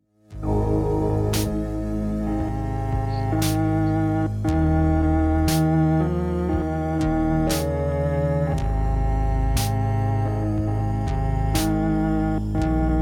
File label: cello